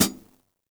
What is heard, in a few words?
hihat - close; JazzFunkKit